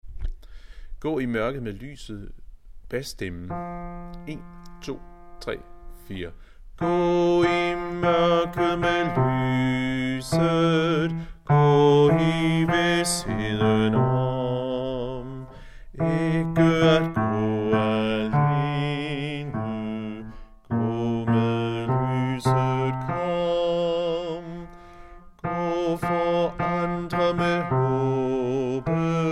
bas

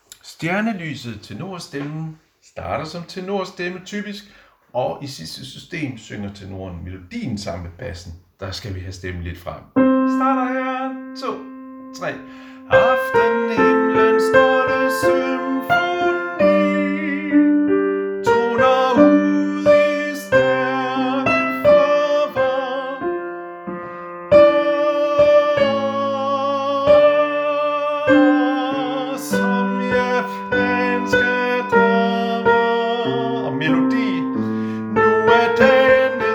tenor; Stjernelyset

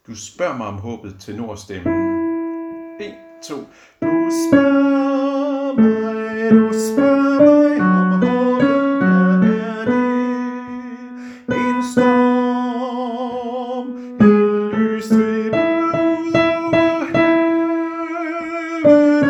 tenor; harlev koret

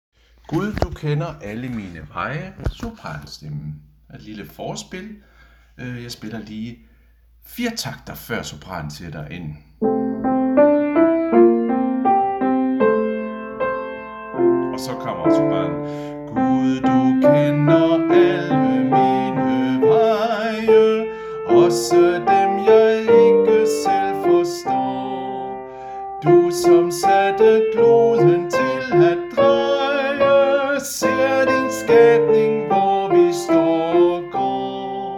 Sopran; harlev koret; Gud du kender alle mine veje

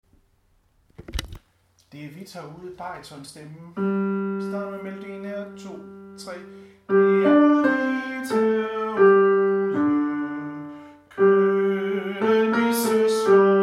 Det er hvidt herude baryton; harlev koret

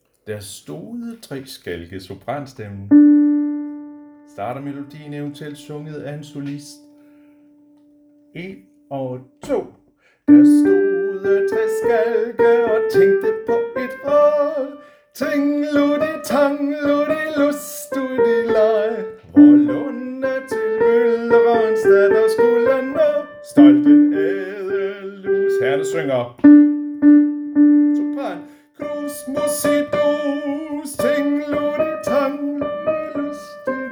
sopran; harlev koret

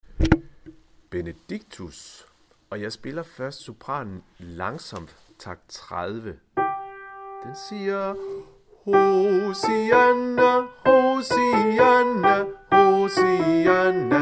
sopran; harlev koret

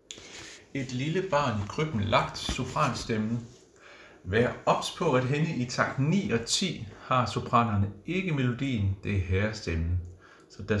sopran; harlev koret

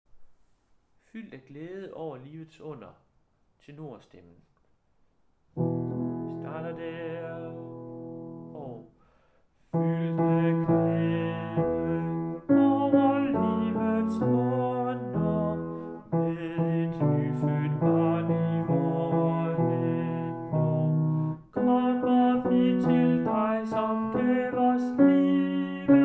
tenor

